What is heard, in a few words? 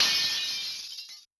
Glass, Yamaha DTXpress-II FX